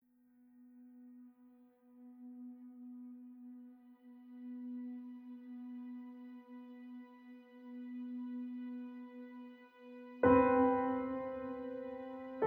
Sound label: Emotional